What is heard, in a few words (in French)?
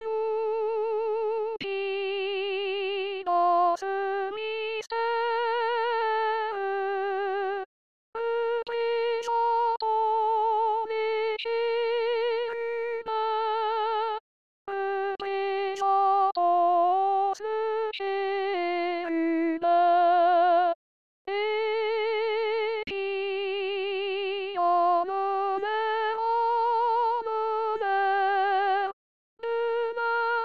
soprano